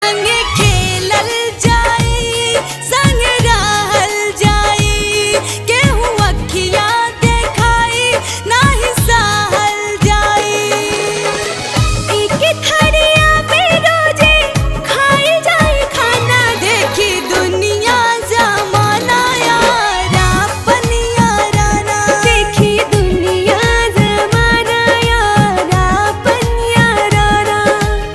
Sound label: Ringtone